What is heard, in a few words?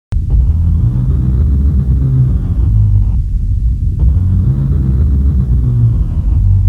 Drone